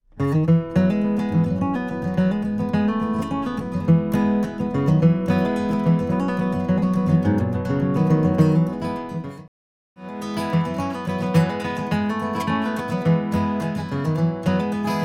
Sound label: guitar EQ out in, Acoustic guitar—EQ out, then in; Mixing for God audio examples